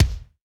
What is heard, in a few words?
Kick, 120bpm, House; Big Fish Audio; Old Skool House; Construction Kits; House of Amnesia